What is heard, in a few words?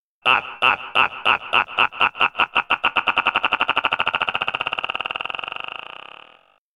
Evil Robot Laughs Wei, servos